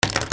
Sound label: OFFICE